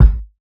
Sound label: kick beater; Drum Sample Pack; One Shots; Slate; SEISMIC